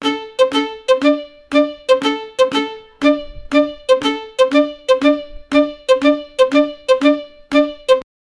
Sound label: violin mridangam